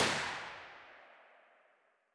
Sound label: kedr loop distant tail; Escape from Tarkov